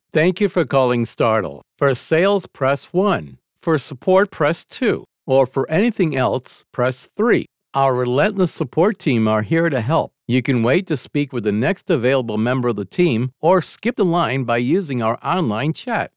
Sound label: STARTLE MUSIC; IVR